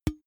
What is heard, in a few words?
big can empty hit damped soft